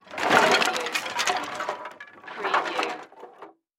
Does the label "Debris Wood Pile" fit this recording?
Yes